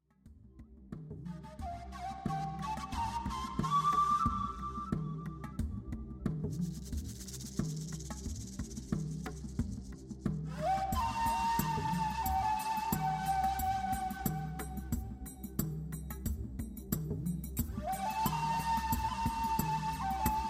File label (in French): Instrumental